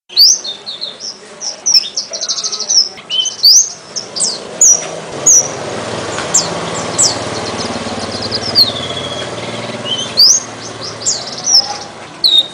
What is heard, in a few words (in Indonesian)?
suara strawberryfinch